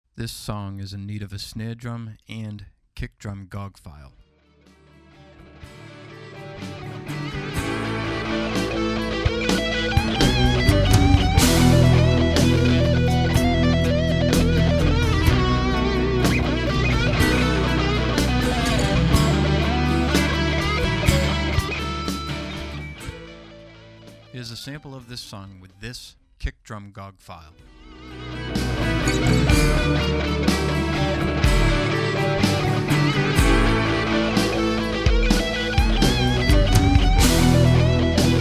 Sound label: Tight Kick